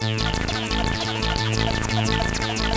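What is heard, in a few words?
Sequence Pattern, Keyboards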